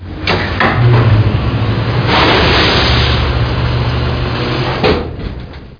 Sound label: ELEV